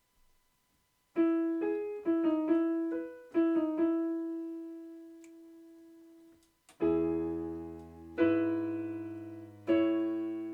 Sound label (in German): solo; De Smet III 5:1